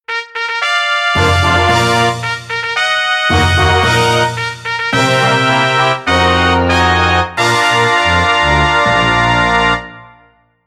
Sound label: fanfare